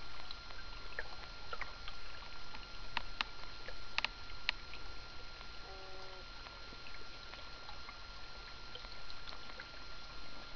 diel clicks and clucks

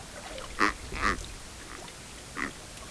Pterygoplichthys pardalis, Amazon Fishes

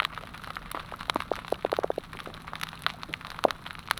click train; soniferous; Gilligans Island

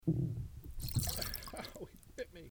Opsanus tau, soniferous Carolina fishes

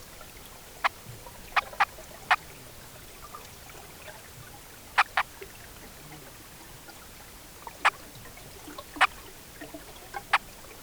Pimelodus blochii, Amazon, soniferous; Amazon Fishes

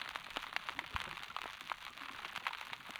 snapping shrimp; soniferous; Gilligans Island

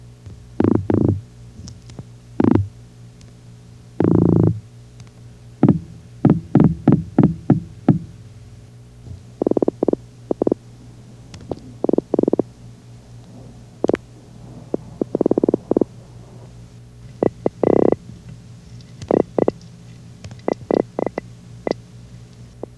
cynoscion regalis; weakfish; Eight specimens in tanks in Maryland, Virginia and North Carolina

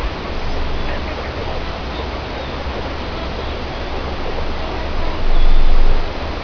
whisle and other

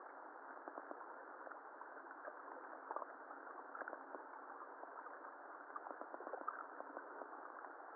tapping, diel tapping noise

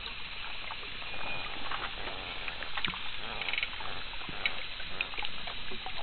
grunt, fish sounds grunt type a

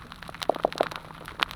knocks; soniferous; Gilligans Island

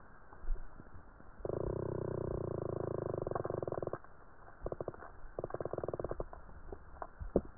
cusk eel like, fish sound staccato; tivoli bay

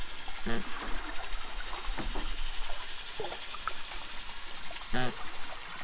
honk type b

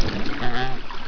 honk c, fish honk type c

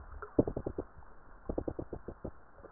fish sound; tivoli bay

